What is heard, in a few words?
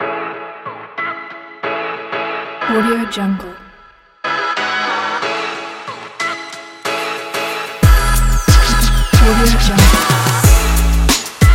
summer hiphop